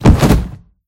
push fall; kidnap; scripted sequences; eli

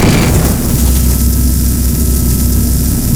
zapper loop; Half Life Model Pack; Half Life 2; ambient levels citadel

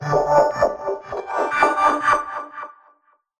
hacking holo appear

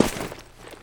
footsteps, dirt; player

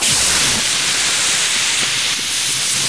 cannister loop, gas; ambient; Counter Strike Model Pack; CS:GO

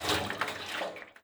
contents, metal barrel; physics; props

